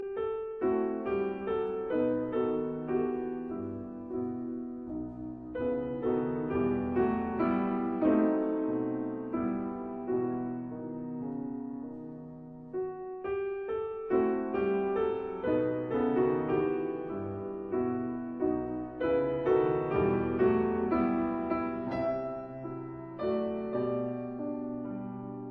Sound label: gigues in E minor; No 5; Elegie deux; Rameau